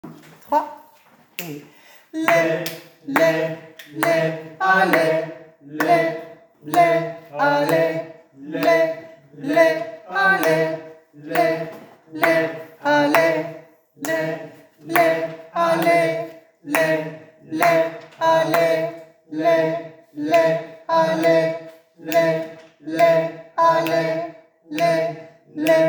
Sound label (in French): hommes